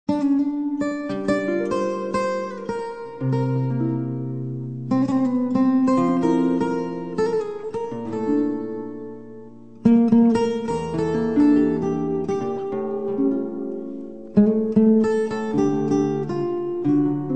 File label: Guitar